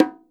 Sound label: PERC, Percussionworks; Sampleheads; NYC Percussionworks; 90 s Sample CDs